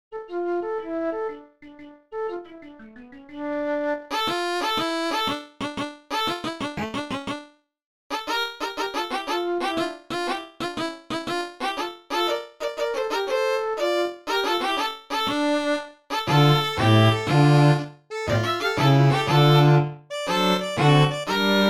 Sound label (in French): xvii e cercle quatuor a cordes